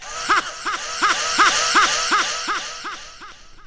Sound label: laugh evil ha ha; ooh ahh